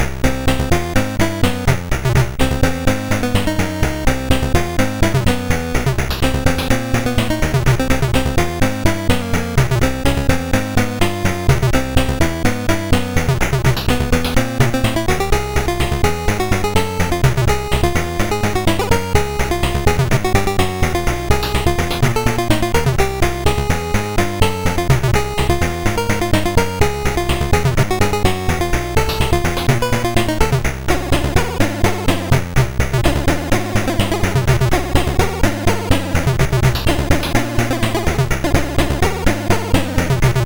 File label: Fugue, MOS6581R4